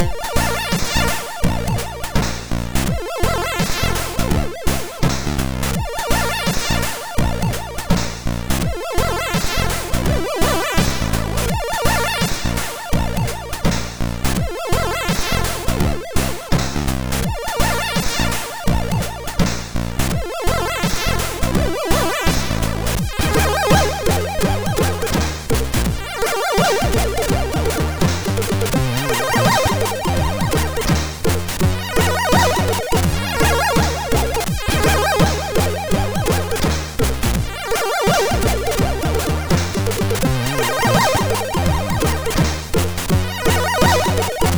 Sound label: Relax, MOS6581R4; The Gee